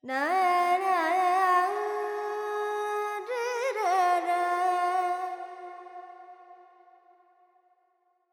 FX Dorian, Vocals, Carnatic Female, Alaps, 70 G; Sample Banks

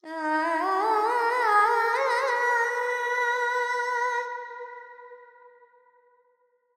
Verb Phrygian, Vocals, Carnatic Female, Alaps

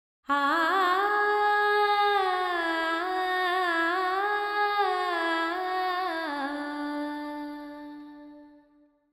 Verb 4-4 D 70, Vocals Female; Sample Banks

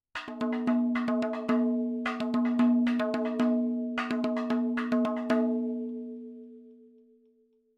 110 7 8 MADALAM FILLS, percussion

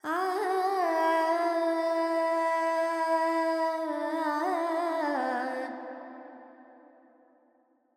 Carnatic Female Alaps, Behag, 128 C, Verb; Vocals; Sample Banks

